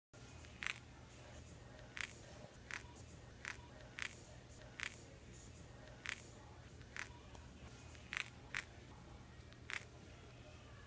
knee sounds

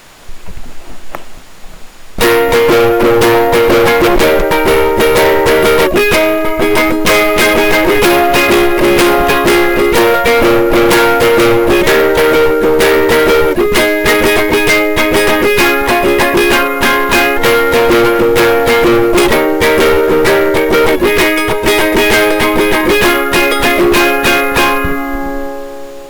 uke

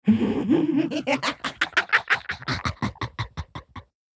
possessed laughter

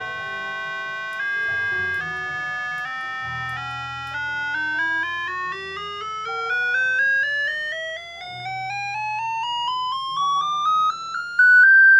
chromatic ascent

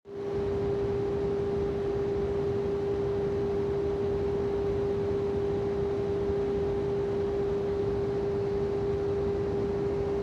fansync

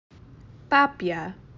duck

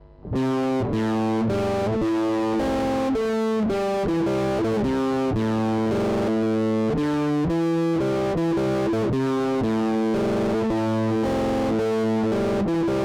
fuzz

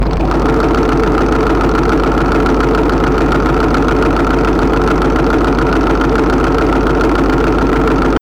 moto engine; baudline

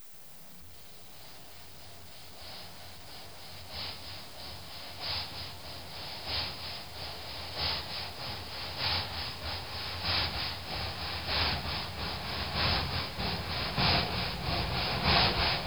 train

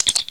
chirp; clean

